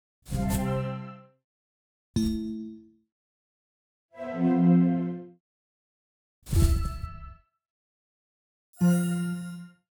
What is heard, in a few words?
Checkout Sounds; Blutag